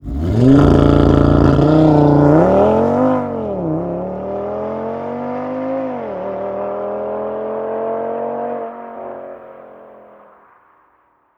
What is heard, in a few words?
Audi RS3 alt mit ASPP drive off fast; audi sport